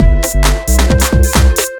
2 Step 133 MIX, House

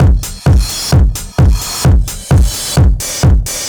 Beat Drive 130, distorted drum loops 130bpm; musicradar

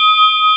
SINGING BOWL; AKAI S6000 CD-ROM - Volume 1; SAMPLE WAVE MIXING 1

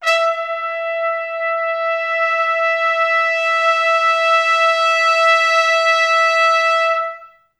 E4, Pop Brass; Best Service; ProSamples vol.19; AKAI

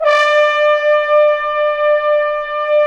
BRS TBNS, LOW BRASS; Roland - LA Composer vol. 1

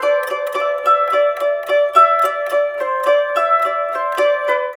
SITUR, SITUR PH; Spectrasonics; Heart of Asia

